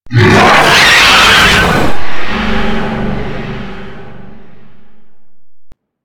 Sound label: Golem Attack Roar; Warframe